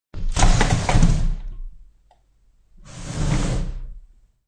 Drwr Opn Cls, Dresser drawer being opened and closed.; Household